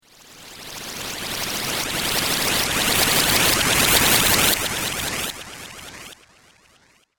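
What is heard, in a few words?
Sci-fi Reverse Laser Delay; Laser Sound; Sci-Fi Laser Sound Effect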